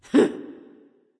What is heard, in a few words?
heh heh; smite; voicepack; hel